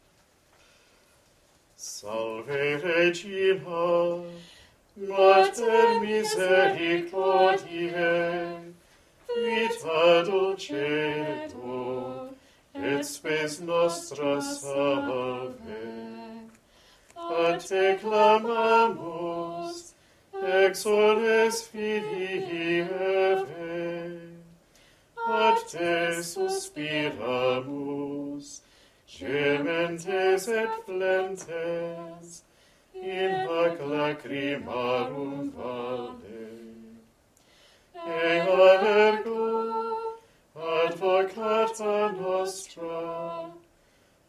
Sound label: Salve Regina Latin